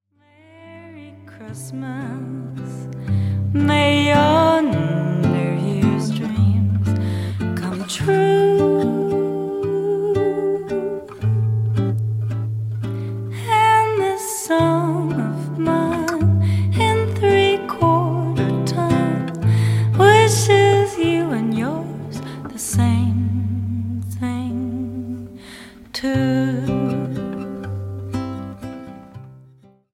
Waltz; Christmas

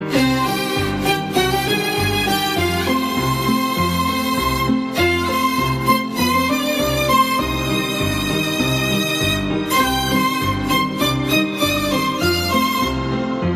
violin, ringetone; Sherlock

